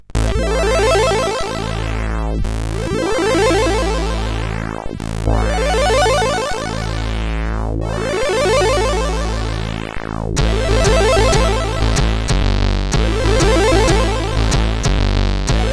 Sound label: Bass test, CSG 8580; CSG8580R5